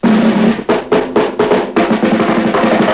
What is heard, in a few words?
drum roll; Blender Magazine volume1.3 1994; moogly